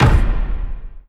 option toggle, ui menu

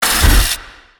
timber chain retract; weapons; dota; shredder

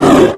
death thunderlizard small, creep deaths; dota

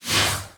melee rip; terrorblade

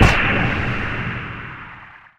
raptor slow; weapons; dota; kez

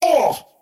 skywrath crown pain; skywrath mage

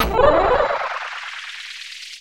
boss bar; dota; dungeon

